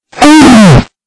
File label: death noise ear rape bass boosted; roblox